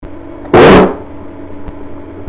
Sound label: fart